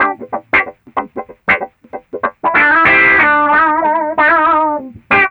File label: GUITAR; East West